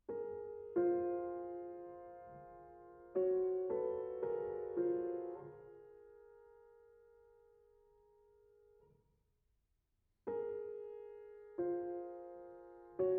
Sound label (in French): Tableaux pour piano, Les enfants. Andante